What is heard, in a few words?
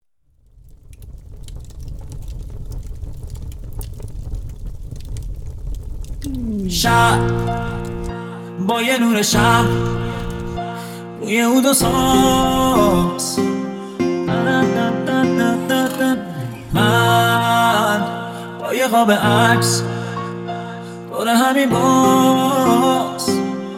Piano Version